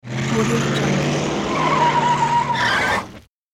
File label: car pass by and screeching brakes